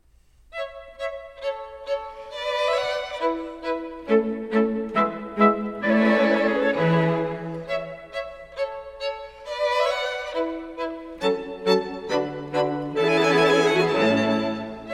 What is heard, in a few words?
Quintet For Clarinet, Two Violins, Viola and Violoncello in A Major